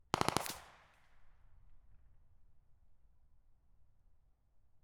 M1928A1 Thompson Submachine Gun .45cal 300m in front Burst of four